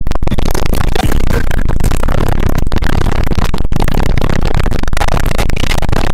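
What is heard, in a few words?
alarm clock; budilnik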